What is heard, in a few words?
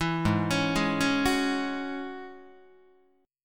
Ab 7th Suspended 4th Sharp 5th Ab down Guitar; Ab7sus4#5 arpeggiated